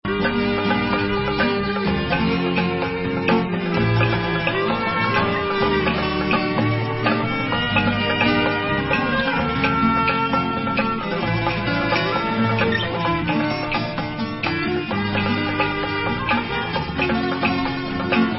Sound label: Armenian Sirto